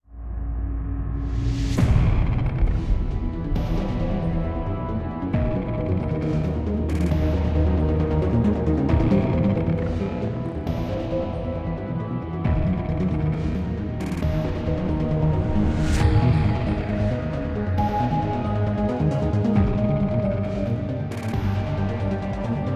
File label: Music Template - Tension; Mass Effect 2